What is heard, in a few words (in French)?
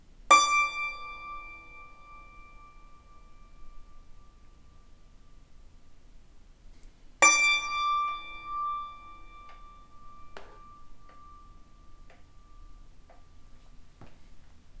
Note isolée, micro fixe et tournant, Yangqin; Umik cal 30cm